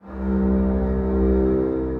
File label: Bowed Wind Harp, Distressed Strings; Instruments; Ableton; Mood Reel